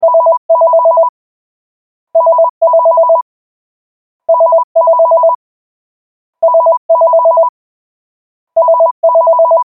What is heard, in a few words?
cordless phone ringtone